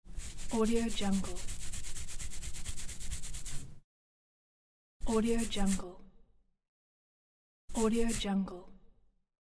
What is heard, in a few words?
scratching head